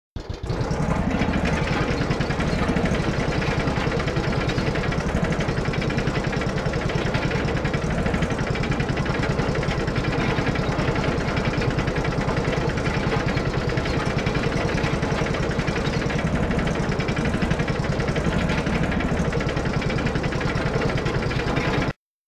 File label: moving